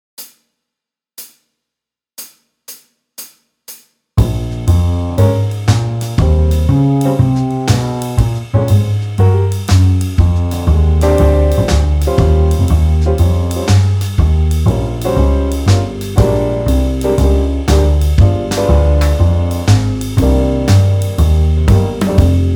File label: blues 120; Etude 3 Backing Track